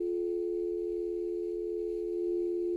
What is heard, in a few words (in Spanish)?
Multiarmonicos